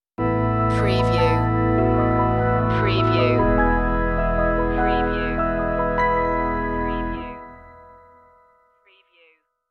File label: rising light stinger; ambient; chillout